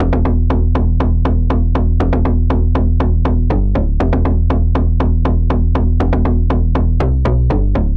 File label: Electronic Point, Bass; Bass Dragon Expansion Pack